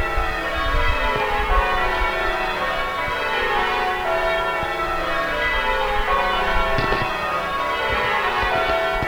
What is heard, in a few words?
rounds after; cccbr